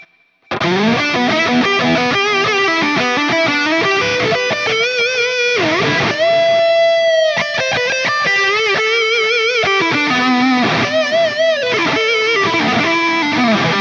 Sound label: Harley Benton DNAfx GiT Pro Juicy Drive Modded